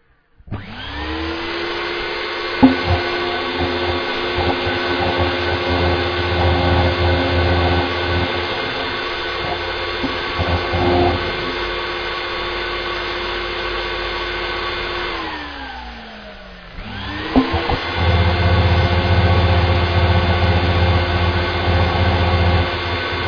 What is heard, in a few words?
hoover; classic hoover sample